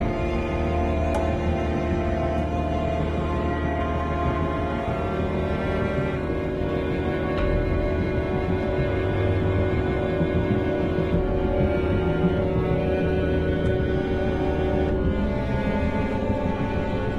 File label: loop Tense